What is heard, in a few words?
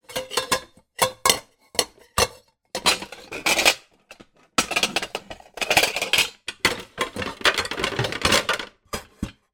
household pots pans rummage movements; zapsplat